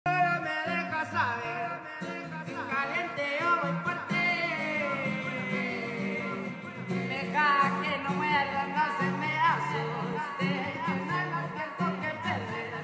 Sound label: Acapella